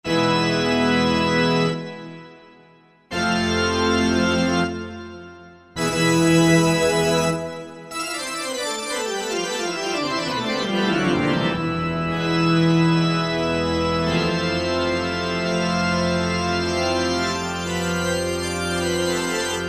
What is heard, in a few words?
cathedral, Korg N364; Factory Combi demos